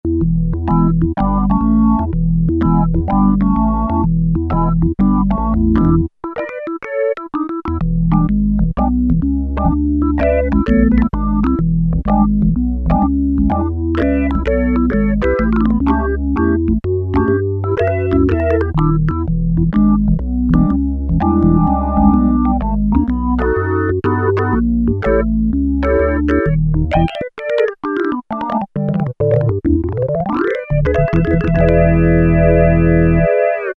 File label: Organ (Slow), Korg PolySix; Factory Presets Bank B